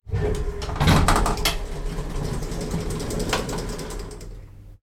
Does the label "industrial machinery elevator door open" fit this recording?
Yes